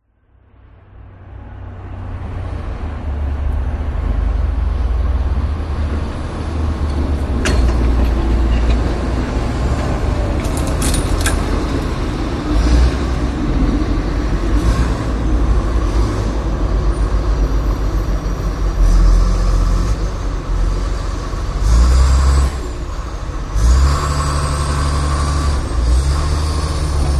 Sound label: snow plow snow plow large close pushing driving shovel impacts; silverplatter audio